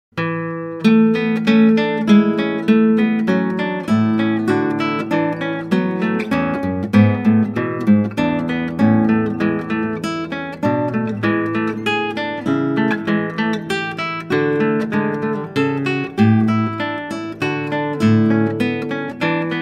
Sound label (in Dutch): Klassieke Gitaar; Bach 50 Solos